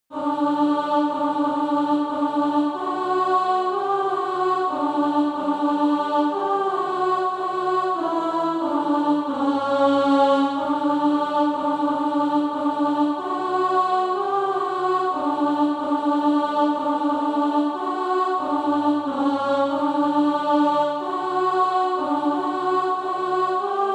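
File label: alto, Practise Music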